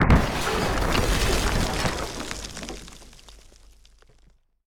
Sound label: Collapse; LOOPERS